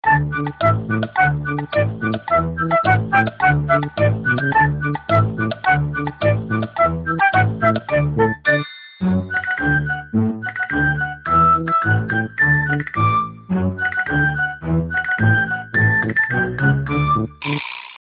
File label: sony ericson cartoon ringtone